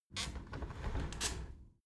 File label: window handle squeak turn close halfway soft; objekte; fenster